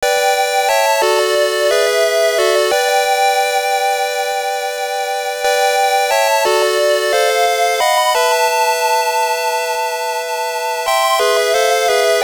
BGM; Uchuu Senkan Yamato; WonderSwan Color

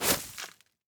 footsteps skid dirt

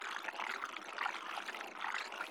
bursting buzzer fly loop; gamerip; Hollow Knight

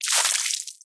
Blood Gush; Friday the 13th: Killer Puzzle

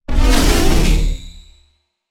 Fly tiro; Shieldmaiden Remix Edition

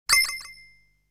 Coin acquisition sound; legend of hero tonma

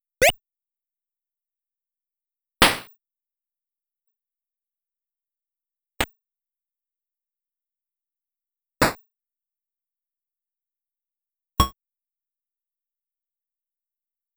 S.E. COLLECTION; Solomon's Key

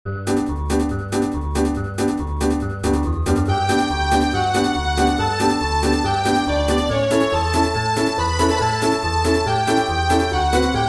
Power Up; super mario star road

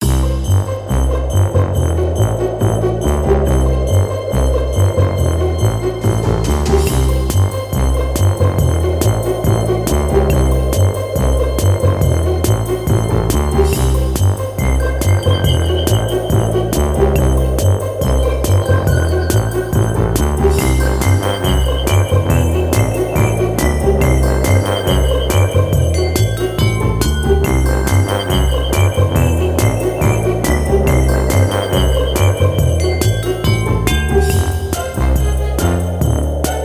Ingame Ice Loop; Boulder Dash XL; xbox 360

